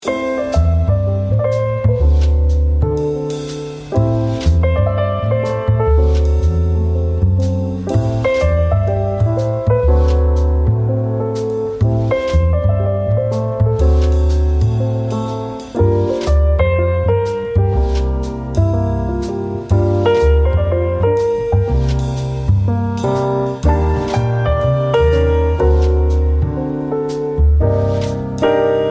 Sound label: cozy jazz